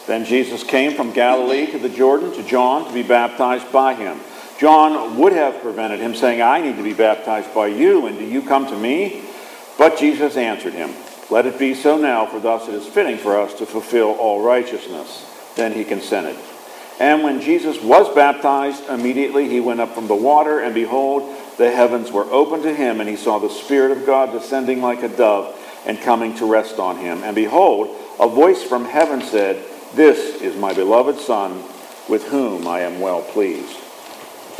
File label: Gospel